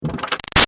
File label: Data Reveal Shifty Wah Open, Squiggle; Squiggles; Multimedia